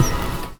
Kick & Tone

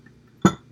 Snare

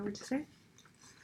Snare

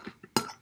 Snare

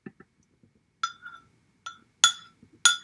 Snare

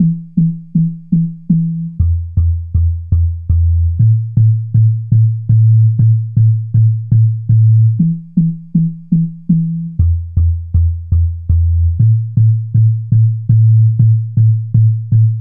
Bass

